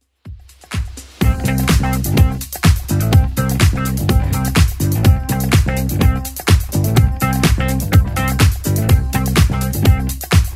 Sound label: All Night Dancing 80s Club 125 bpm